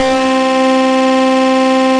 Synthesizer Middle C Notes, Lead

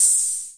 wersi hho, Wersimat 24; drum machines

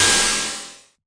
FM Cymbal, harsh; Harsh FM Cymbals

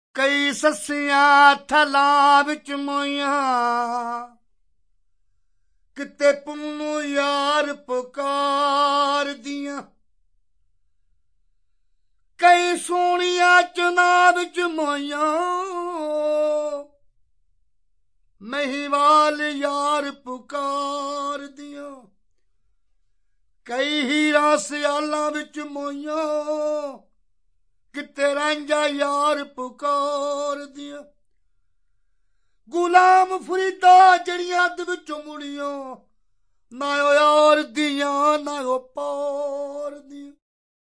deep india, Indian Singing; VOCALS and SPOKEN WORD

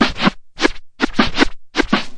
stoned scratch

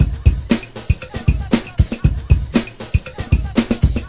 118 bpm, soft break soft vox

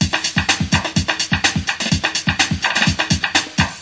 126 clanger

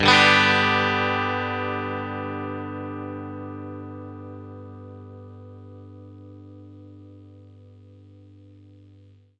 clean chord G, clean electric guitar chords

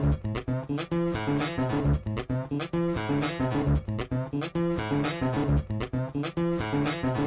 guitar loop, blues; remixable collections

